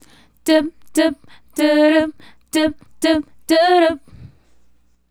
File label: Female Group, Hip-Hop and RnB Vocals